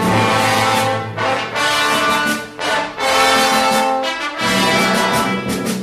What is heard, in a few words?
horns, Loops, Phonky